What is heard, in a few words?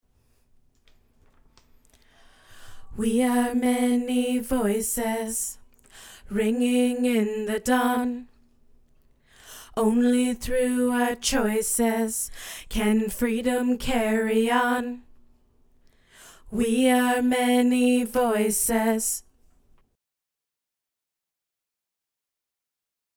Backup Vocals; Like Music